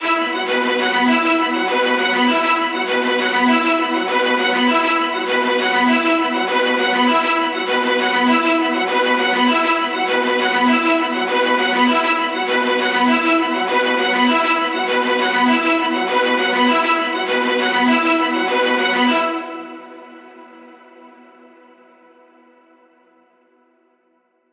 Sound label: Viola Ostinato E 100BPM; Construction Kits; STEMS; Ghosthack; SkyRealm; Kit 15 - Emerald Gates